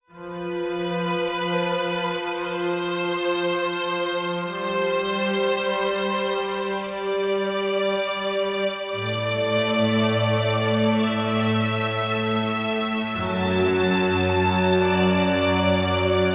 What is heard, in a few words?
Rich Full Strings; Combinator; Propellerhead Reason 3.0